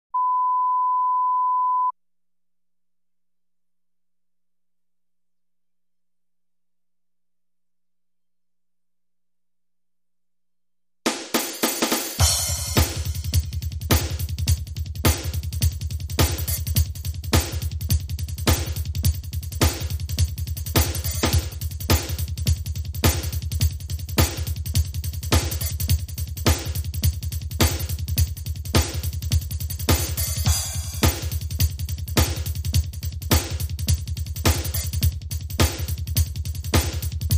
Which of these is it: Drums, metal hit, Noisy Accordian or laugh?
Drums